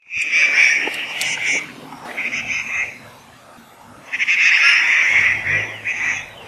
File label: Grey Treepie calls; Kenting National Park Hengchun Peninsula Pingtung County Taiwan